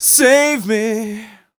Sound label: m 127 mel a, Vocals